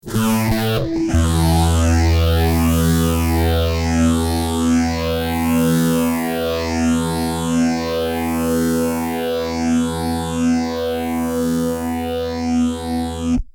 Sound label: fuzz gtr